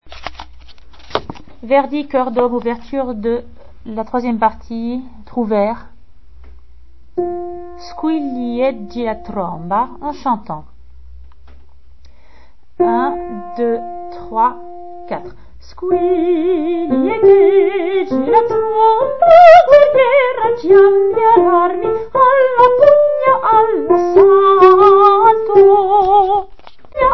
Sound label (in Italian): squilli echeggi la tromba guerriera hommes